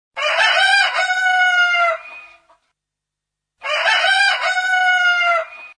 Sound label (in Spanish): gallo